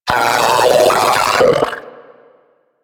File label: Arachnoid Vocal Patrol; Enemies; Vocals; Warframe; Corpus; Arachnoid Hunger